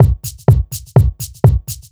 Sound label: HOUSE BEATS 125